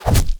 bullet hit player

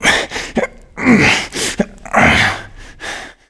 player climb efforts; player climb efforts tmp

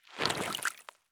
turn puddle; Escape from Tarkov

